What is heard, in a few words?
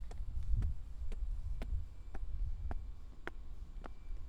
footsteps